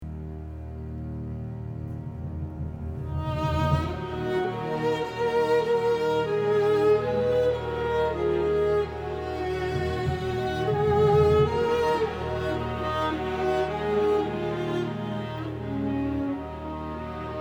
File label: Ring The Bells